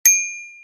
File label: ding